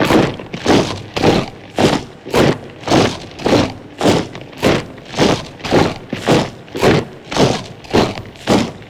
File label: Marching Skeletons